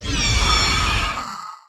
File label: Sprint, Bear; bossAttack